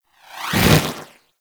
Dragon Pelt Idle Wing Flap

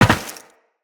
land metal, footsteps; Tenno

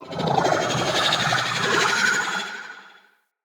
Corrupted Slug Vocal Death

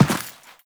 step dirt, footsteps; Warframe; Teshin

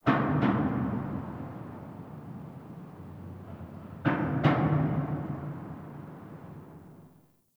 Ambient Metal Bang; Warframe; Nineteen Ninety Nine

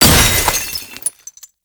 Void Heavy Scythe Crystal Explosion; Warframe; Duviri; Incarnon Adapters; Melee

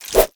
Nunchaku Start Whoosh; Warframe; Tenno

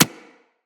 Bullet Impact Generic

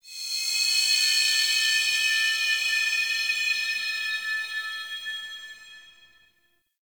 Glassy Texture; Ambience; Warframe; Shadowgrapher

